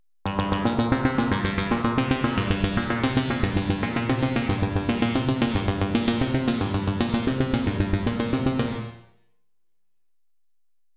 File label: Blip